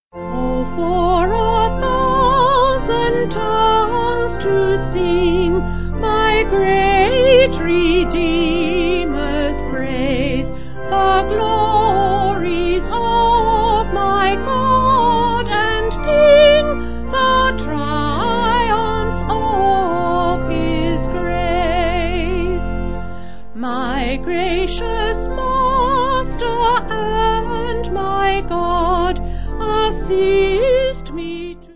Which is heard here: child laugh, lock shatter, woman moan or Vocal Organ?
Vocal Organ